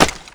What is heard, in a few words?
grunt asphalt run